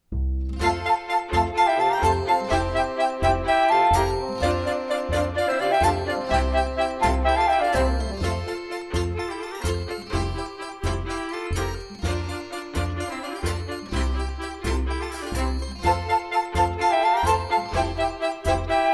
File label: accomp.